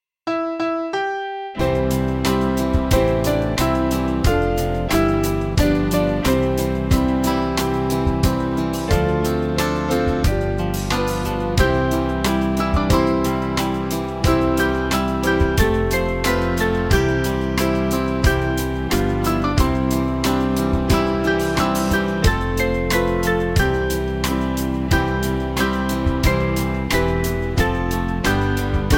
Praise The Lord, Chinese, Band; Praise the Lord, let us praise the Lord; Chinese: 你们要赞美耶和

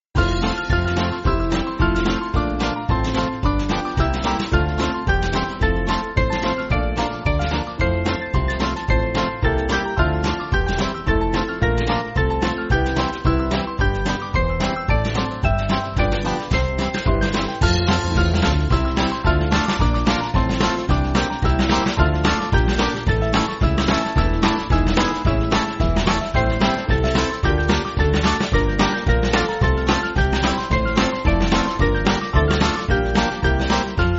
Band, church music

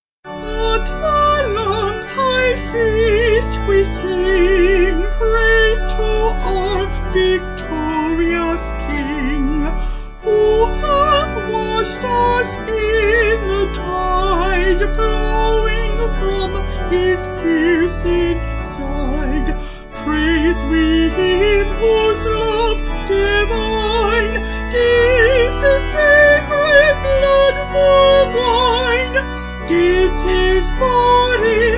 At The Lambs High Feast Vocal Organ; Vocals and Organ; Sung Lyrics

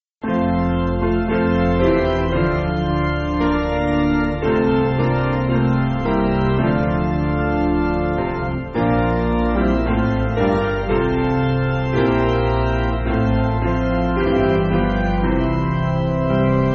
Piano, Backing Files for Churches

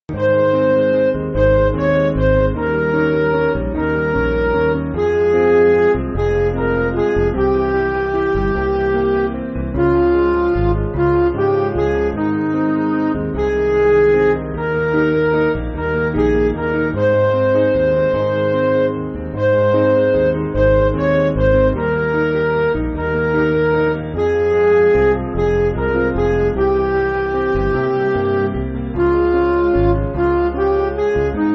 Midi

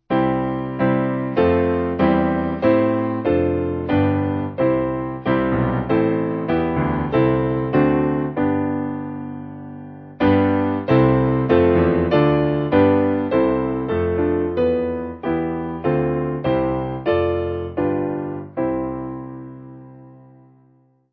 Piano

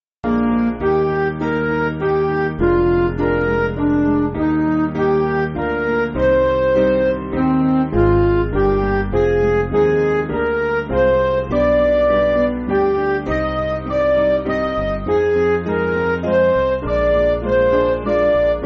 Midi

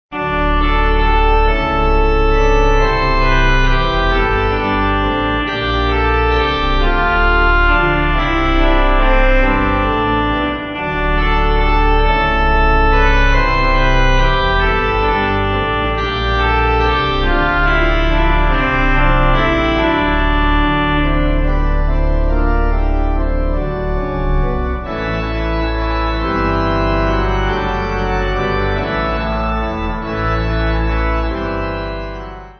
Pipe

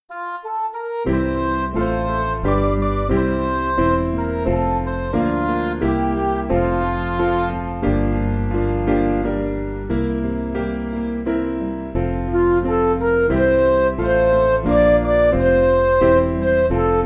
There Are Promises, Midi; Trefforest

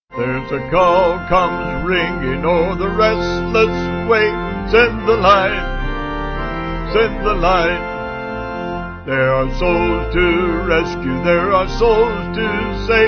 Vocal Organ

